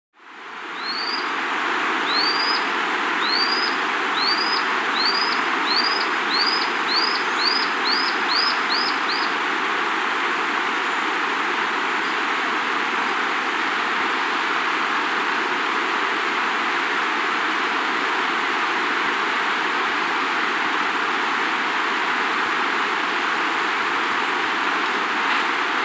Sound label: Lanceolated Monklet (Micromonacha Lanceolata), Andean Birds; S. America